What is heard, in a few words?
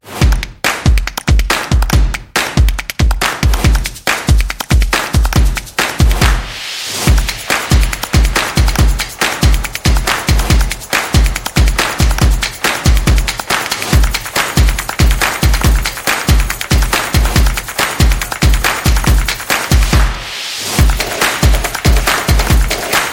Football Sport Percussion; action drums percussion